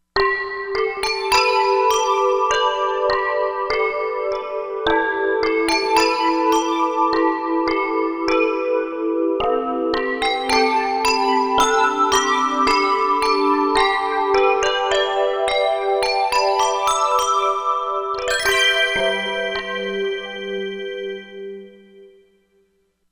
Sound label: Water Bells, Korg MSC-07S Synth; Korg MSC-07S Synth 2